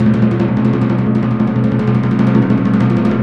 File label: PRC TIMPRO, Timp Roll; Roland LCDP03; Timp Rol Dry